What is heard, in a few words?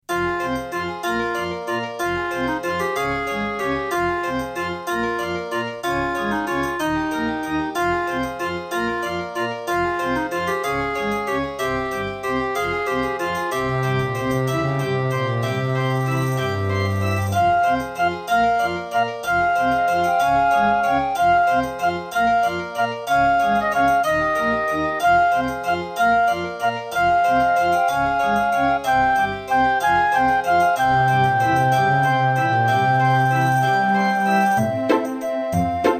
Roland SC-8820_55MAP; Death Gate